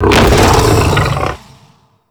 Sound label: Howl Run Start; Warframe; Voruna